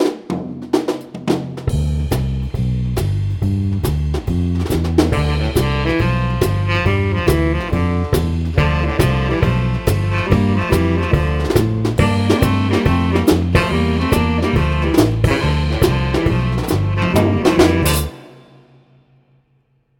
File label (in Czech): policajti swing